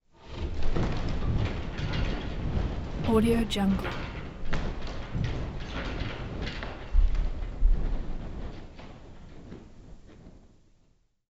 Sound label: wood movement slow